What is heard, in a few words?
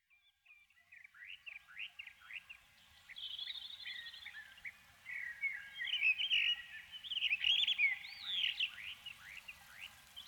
Bird Island Nature Sounds